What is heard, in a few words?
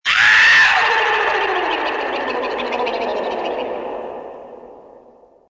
Synth scream; ужастный крик!